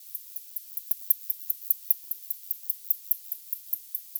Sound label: Vespertilio murinus, batcalls, playback